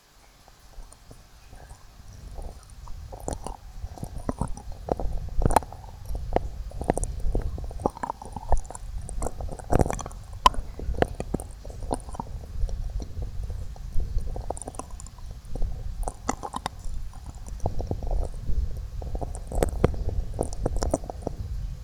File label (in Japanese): 右耳奥舐め, ASMR